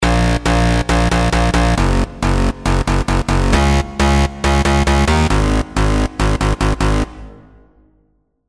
COMP BOUNCE BASS

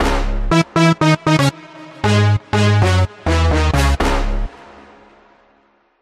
FAT BRASS

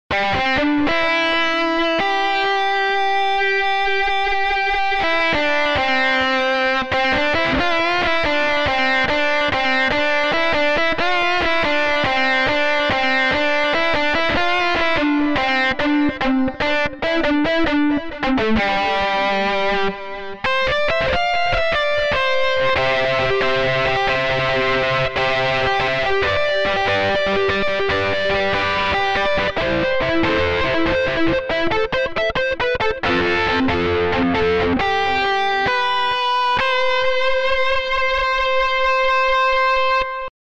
Fender Strat Lite

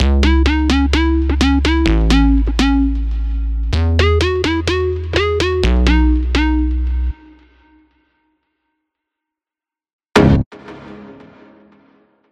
CRUSHED SINE

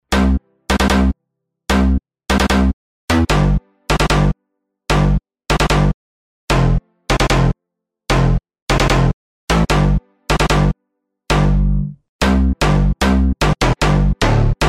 Bass Rave

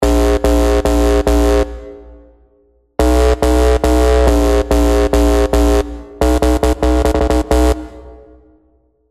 COMP GARAGE BASS

